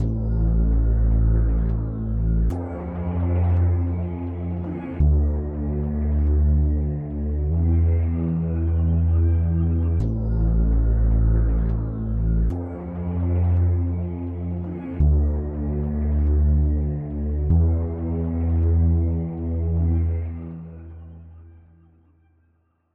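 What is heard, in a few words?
synthesizer; mankind concept; mesure 21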